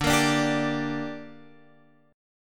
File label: D Suspended 4th Sharp 5th D, down, Guitar Open F; strummed